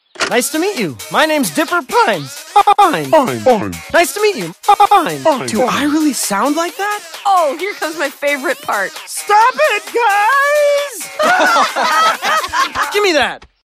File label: Voice Crack Remix